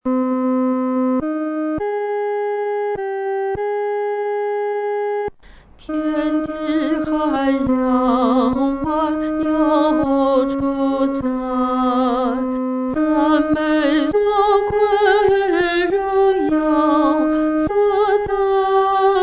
独唱 第二声

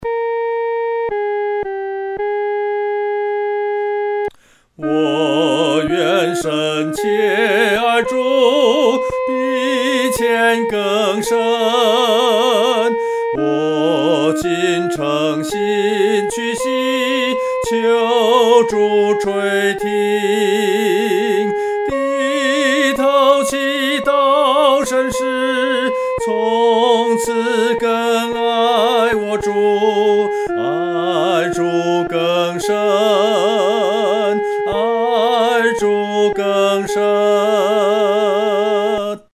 独唱 第一声

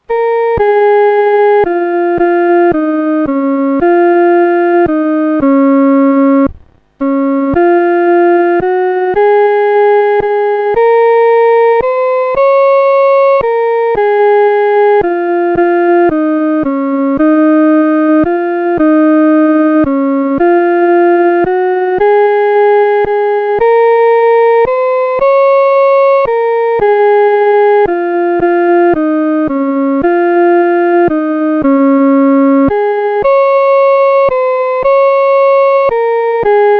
独奏 第一声

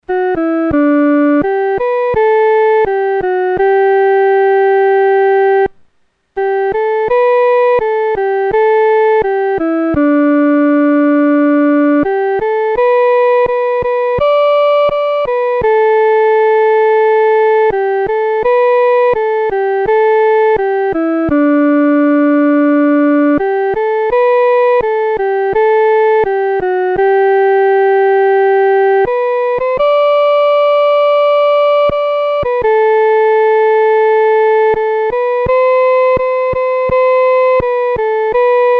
独奏 第一声

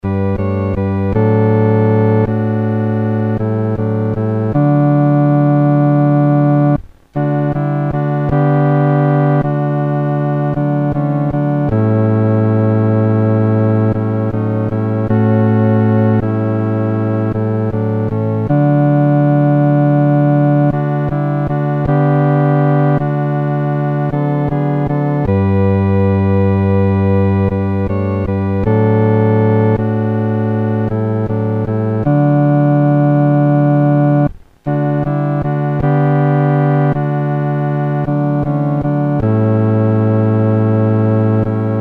独奏（第四声）; 凭祢意行

